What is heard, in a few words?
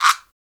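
perc afro, gourd guiro; Roland LCDP14 Africa VOL-2; Afro Toys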